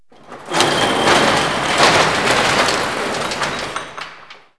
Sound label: garage door